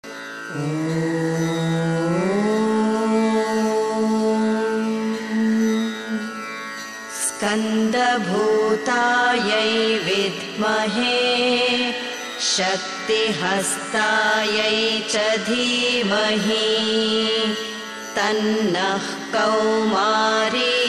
subramanya kaumari gayatri

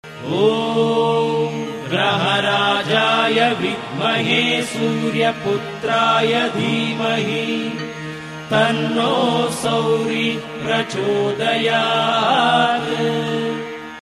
shani gayatri male, gayatris

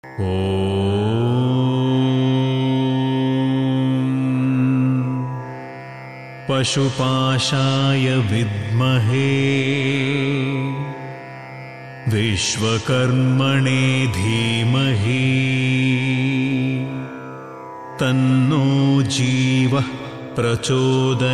vishvakarma gayatri

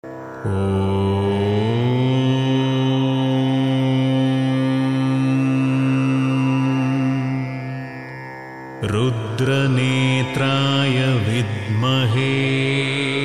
agni gayatri

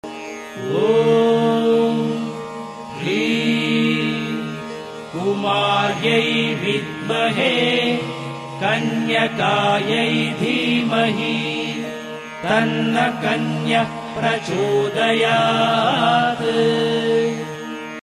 kanyakumari gayatri male

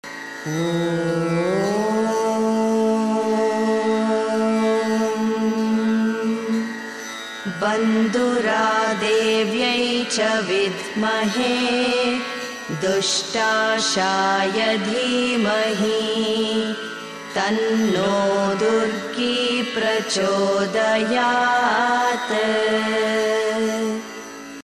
chandi durga gayatri

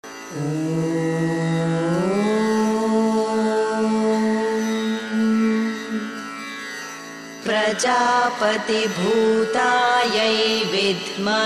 varahi gayatri

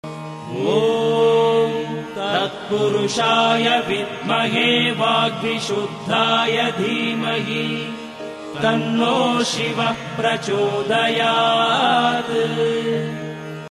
shiva gayatri male voice

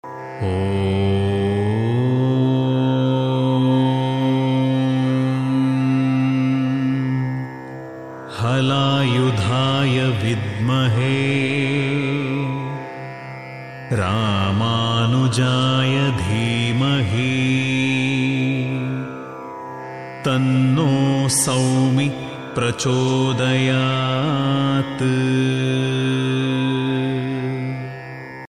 lakshmana (rama) gayatri

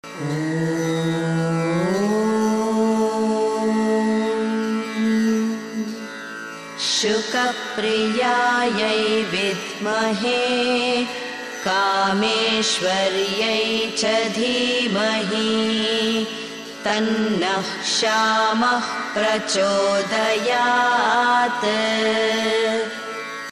shymala (shama) gayatri

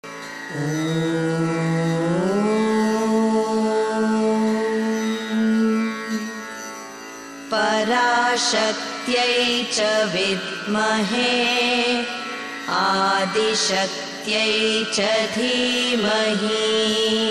om shakti gayatri

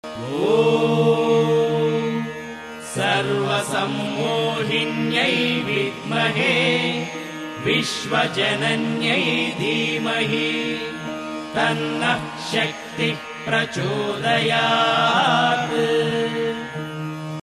shakti gayatri male voice

